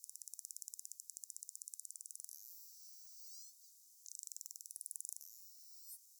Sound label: burst pulse